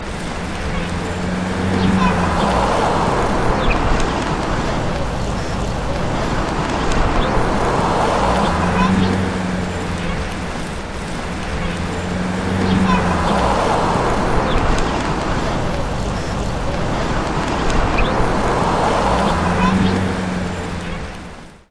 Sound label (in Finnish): kaupunki